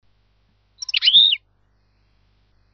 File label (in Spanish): chipicolios, campero